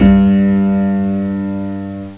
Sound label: piano g1